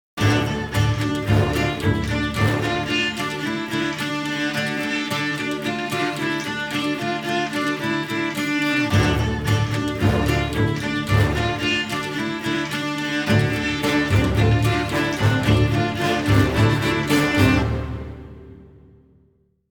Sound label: Monster Hunting Combat; The Witcher 3 Wild Hunt; Skellige